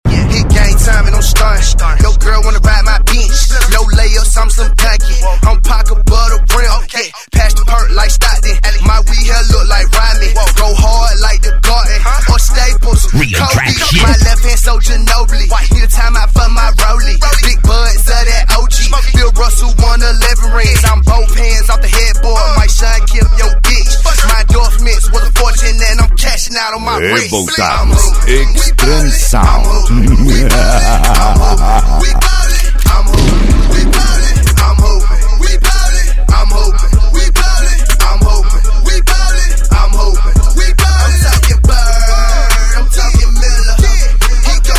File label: hip hop on the track